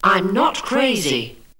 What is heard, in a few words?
VOX GENERAL; Megabass Remix! 2